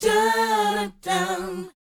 BOYZ D#, Vocal Planet, R&B Dance; Spectrasonics Vocal Planet

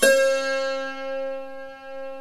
SAROD

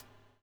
BUBL, Backbeat; Spectrasonics - Backbeat

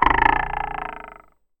Box & Ruler, contact mic

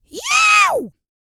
YELL, MALE HUMAN; Spectrasonics Vocal Planet

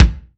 BD, BASS DRUMS; EdgeSounds

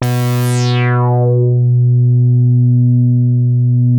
B3, Bass; E-MU Producer Series Vol. 6 – Analogia Project (CD 1); Boom da Bass 2

